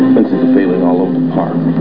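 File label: fence fail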